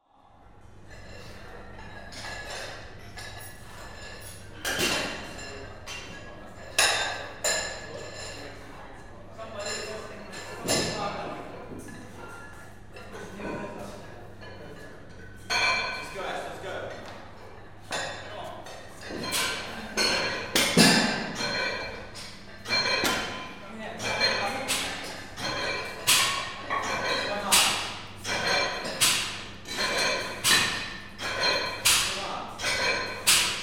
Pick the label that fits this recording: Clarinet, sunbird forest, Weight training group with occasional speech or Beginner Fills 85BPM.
Weight training group with occasional speech